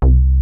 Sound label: MINIMOOG